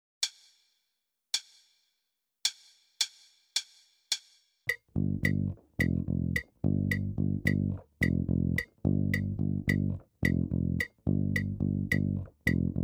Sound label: Rock